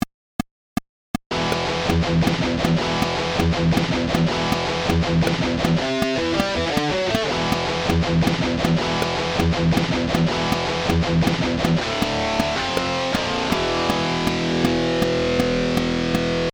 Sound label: Rhythm